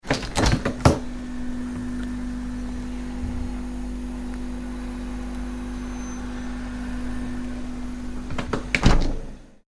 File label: Fridge Door, Sound of refrigerator drone with door being opened and closed